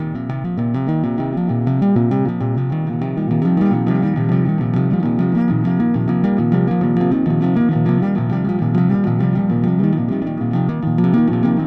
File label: wheelwork loop; Tesla's Wheelwork; Building Loop